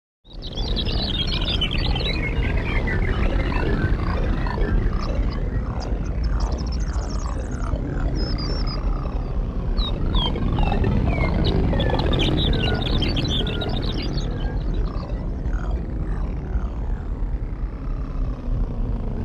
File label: Electronic signal